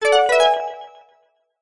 multimedia game tone advance proceed synth delayed